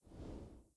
UI Fog Area Open; Conquest; blue archive

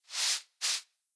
Broom Sweep; blue archive

